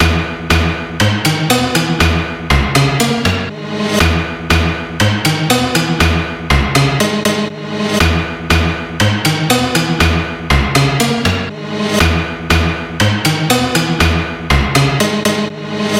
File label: Rap Beat Remix